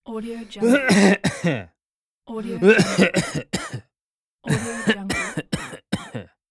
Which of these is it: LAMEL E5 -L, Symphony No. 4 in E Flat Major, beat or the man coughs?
the man coughs